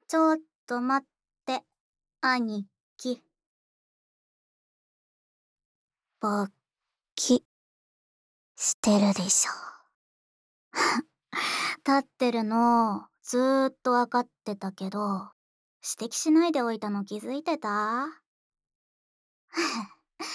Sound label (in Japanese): 耳舐めオナサポ！（右耳）, ASMR